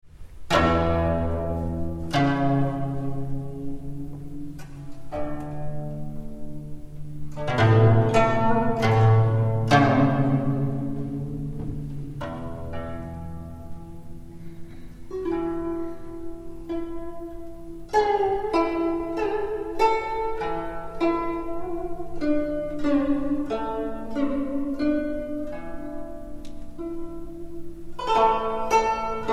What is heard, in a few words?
zheng